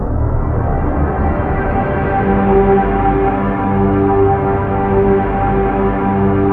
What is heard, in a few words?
T-3; Rubber Chicken Software Sample Bank; KORG